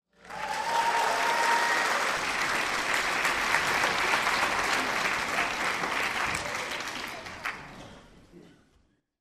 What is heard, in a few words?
human audience theatre applause cheer